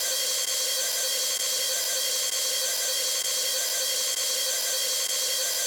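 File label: kawai k1 hi hat ALT; wavetables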